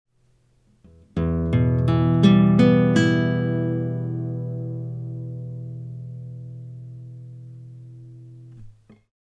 Behringer C-2 + ADA8000

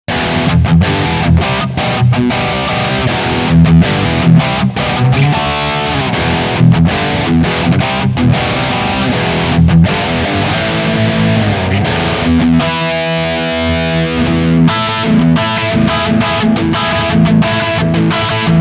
Schecter C-1 FR-S Bridge Pickup Highgain

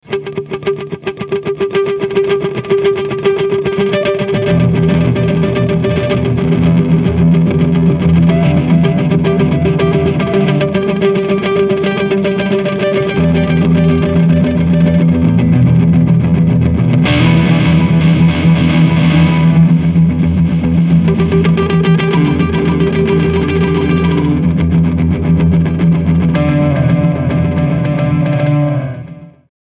Preset Pattern

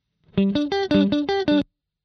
Echelon Trails off

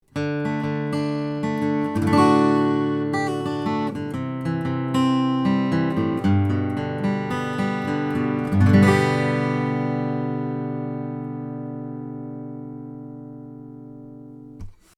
OMNI Acoustic Picking 35cm 15th Fret

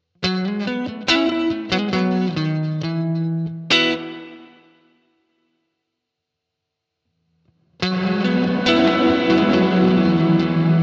VALETON Dapper Indie reverb hall riff

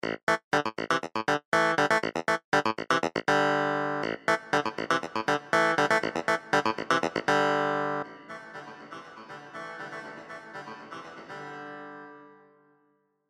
Lexicon Chamber Clavinet

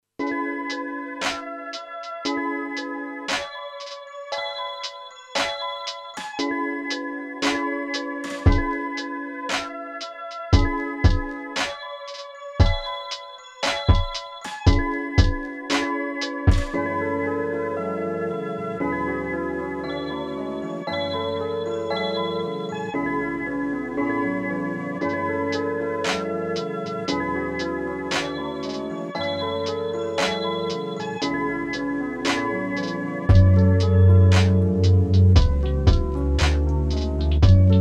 Novation Circuit Rhythm; Heist

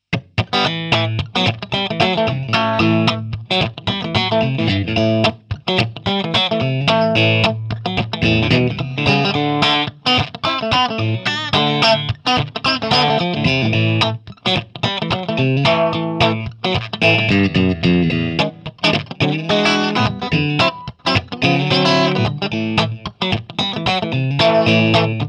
Single Coil Bridge Middle Passiv Low Input Clean

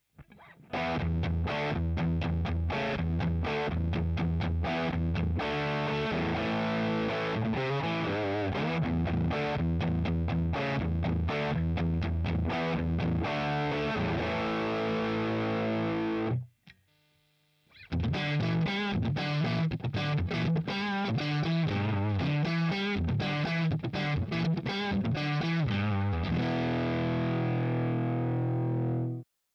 EL34 REC; Boss Nextone

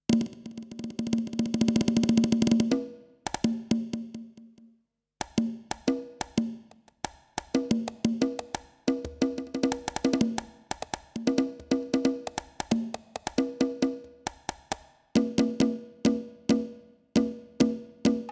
Korg S3

